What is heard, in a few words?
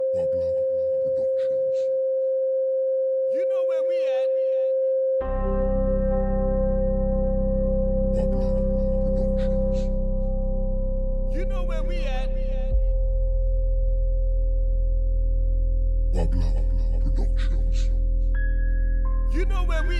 Reggae Dancehall